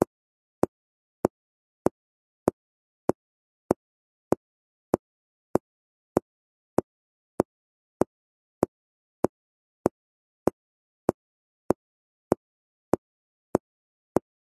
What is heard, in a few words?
water drips steady slow from height on carpet; nature